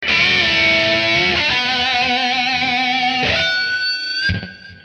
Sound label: 122 lead